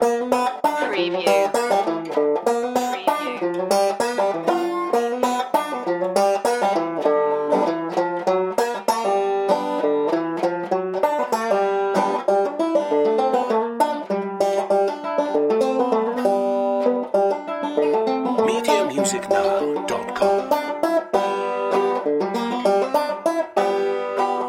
catfishin loop

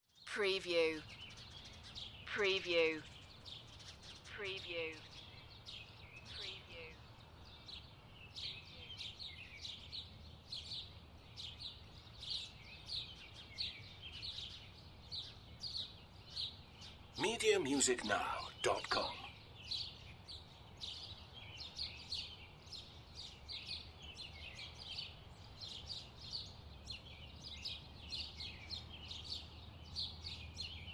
Park Birds Distant

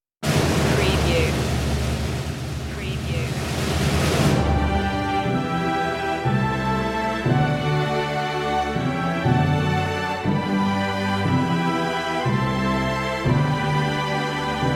god save the queen slow no choir

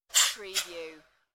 Whoosh Hiss; beanstalk audio

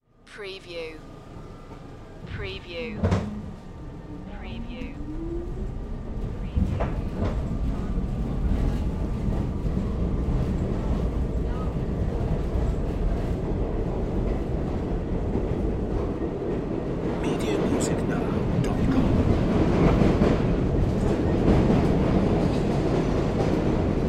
Subway Train Interior

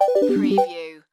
Slot Machine Musical Ditty

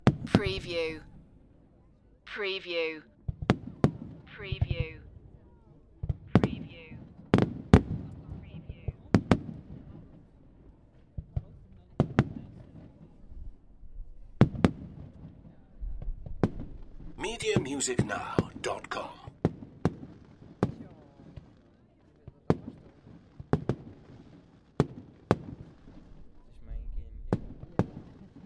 Fireworks Display Bang and Sizzle

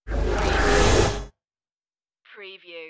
space lift door

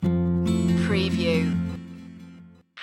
summer rain stinger

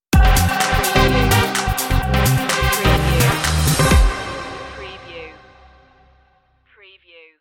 beat stinger

